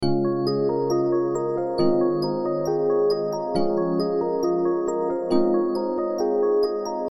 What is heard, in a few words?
goin on an adventure; looperman; PirateTreasureHunt